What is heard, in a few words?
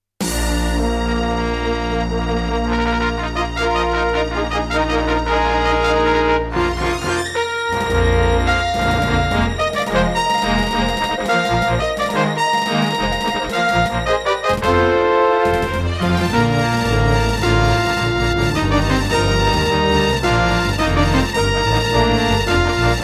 Intro, Boss Dr. Synth DS-330; Dark Forces